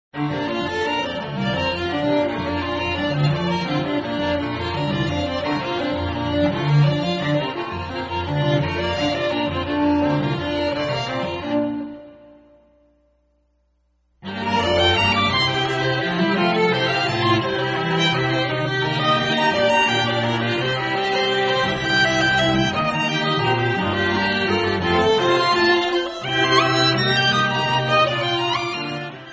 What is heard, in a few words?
stringquartet